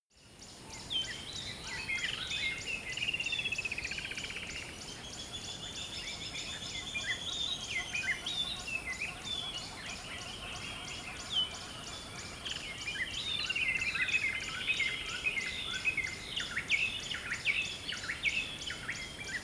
river dawn bird calls

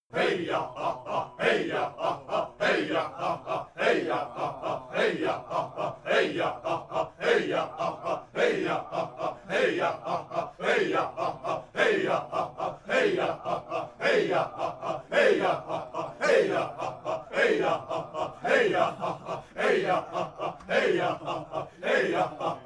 chants simple

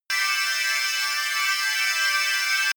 promo pad

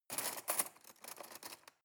cutlery cake fork drawer handle

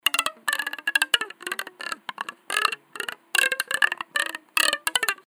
rubberband tension

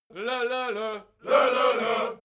crowd musical bawling

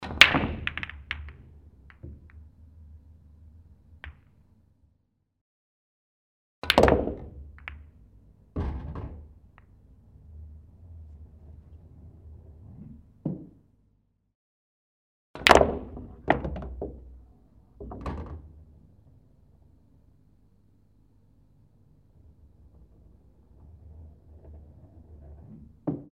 billiard shots

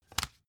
cd cover close short

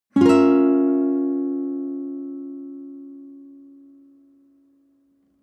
nylon guitar chord high diminished

